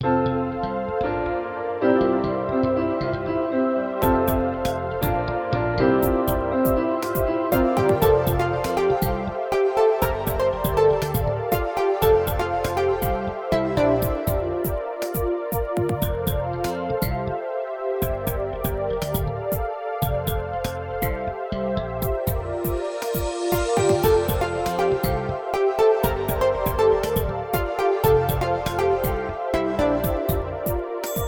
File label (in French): Tapis sonore